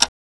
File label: tick; ravend